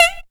Heep, Sampled Notes; Zero-G Jungle Warfare 1, 2 & 3

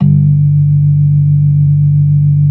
B3 G 3; Best Service; Advanced Media Trax Modern Composer; B3 LES 1

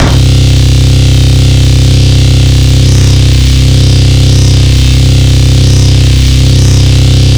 compressed vocoder bass

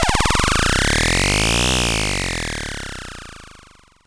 SYNTH FX

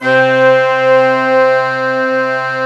ARM BRASS C2, Synth; US ARMY

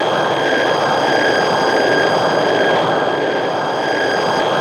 WEIRD BUZZ; AMG - Vince Clarke Lucky Bastard

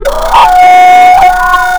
WHISTLE NSE; Big Beat Samples & More; AKAI

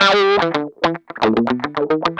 GUI, LICKS; 110 LICKS

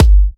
G, Kicks; markus hakala

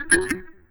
trick, signals; AKAI; FX SIGNALS

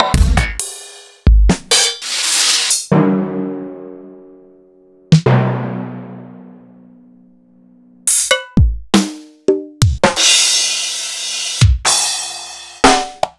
EMU Proteus-2000; m8

